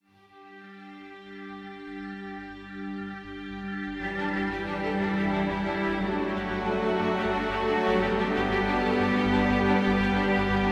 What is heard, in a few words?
Prize Ambient